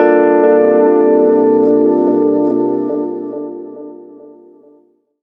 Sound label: RHODZ; Rawcutz - Nu Forms